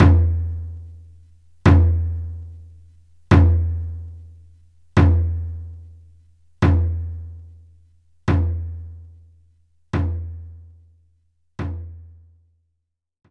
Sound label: Surdo Open